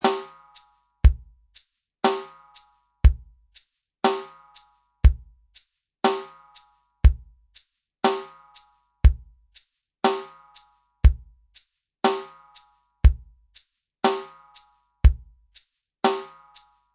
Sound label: Reggae; Curso Baterna; Aula 2